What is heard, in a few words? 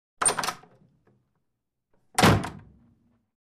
Opened and slammed the door; Открыли и захлопнули дверь